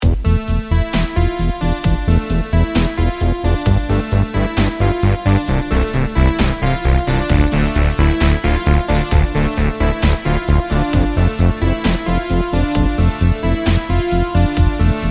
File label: brass organ